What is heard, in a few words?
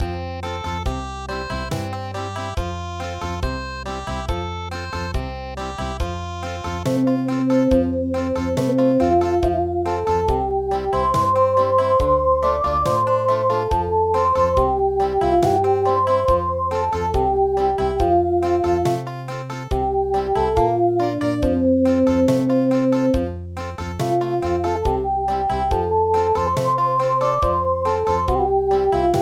Country